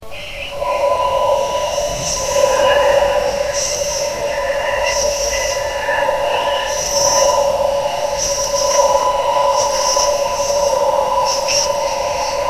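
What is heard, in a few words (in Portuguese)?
sussurros de almas chamand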